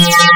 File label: VOCAL